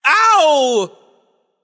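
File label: nonverbal ouch; smite; voicepack; merlin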